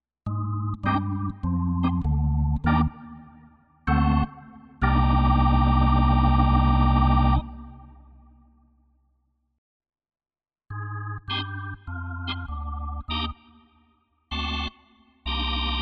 Organ Drei Orgeln im Vergleich; NumaOrgan2

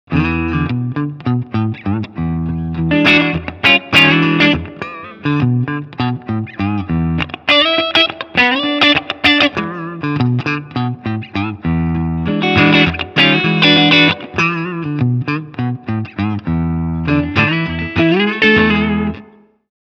Clean Channel ohne Bright S

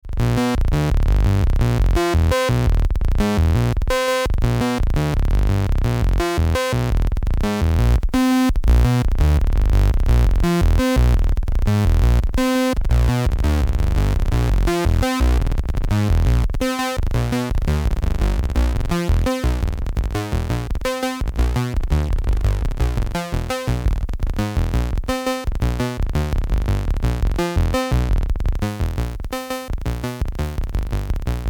Solus Bass Sequenz

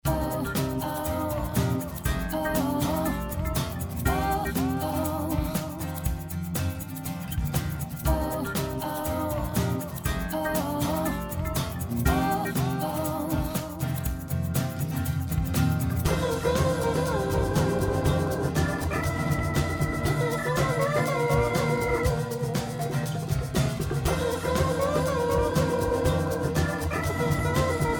song ohne bass; steinberg spectral layers pro 8

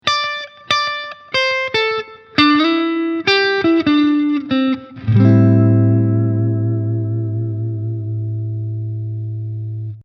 Lick nach Am7

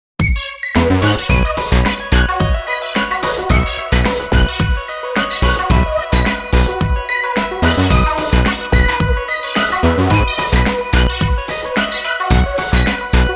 Bratzbass

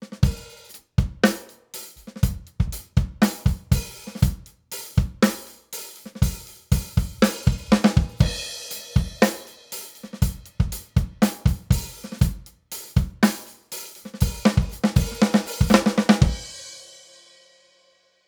Summit Audio EQP 200B Drums mit EQP 200B

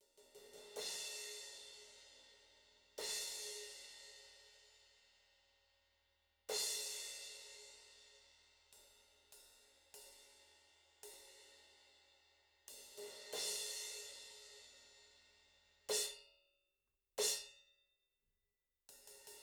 Test Impulz Crash; Zultan

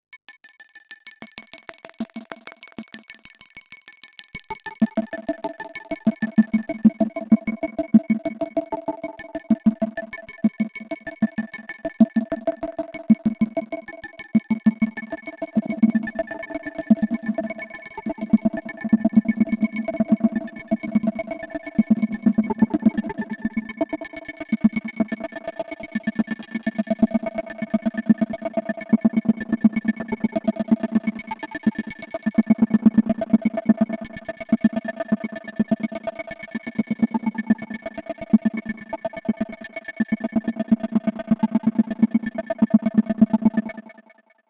solaris dschungel

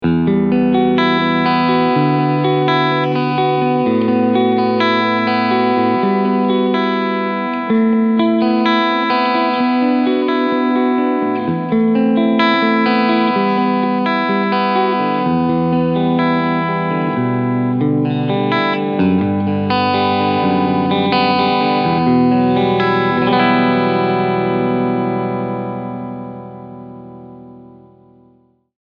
Cleansound Pos. II

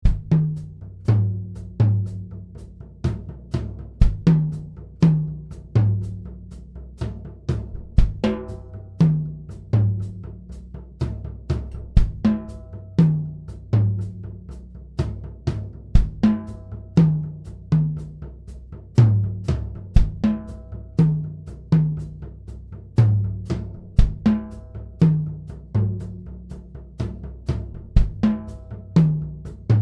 Tom Toms mit Overheads

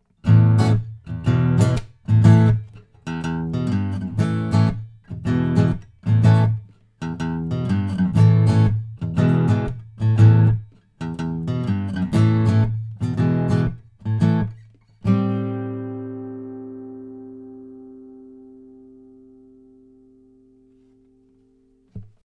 Akku mono

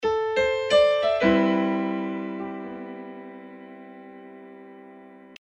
Klangbeispiel Piano 90BPM Elastik Pro

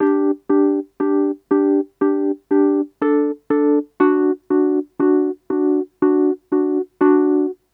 Loop record mit the tbone MB85 Beta

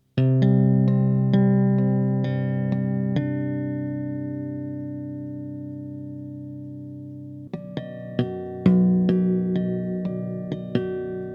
EBS Mikro Obertoene; Bogart

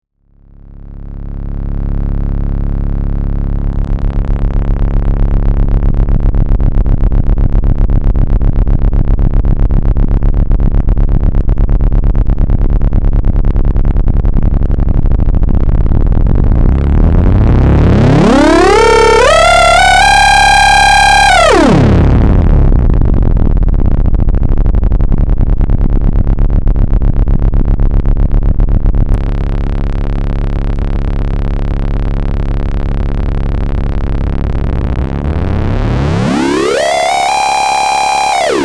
Ringmod Mond Sonne

